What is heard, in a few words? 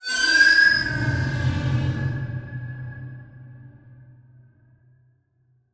Transition Metallic Hit To Whoosh; Production Element